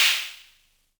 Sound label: Snare, Univox SR-95